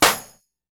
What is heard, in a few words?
Snare